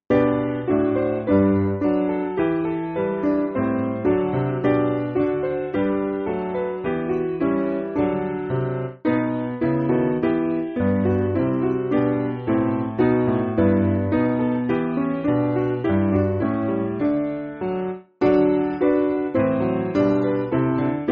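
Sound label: Piano, Music Backing Files for Churches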